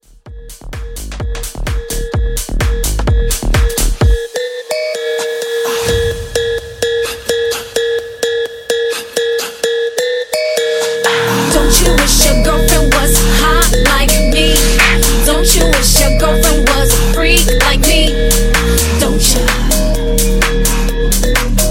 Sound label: Drift House Mashup, 128